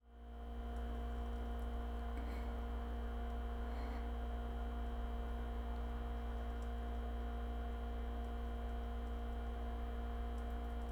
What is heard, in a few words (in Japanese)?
大、吐息ミチ音ポチャ音, トイレ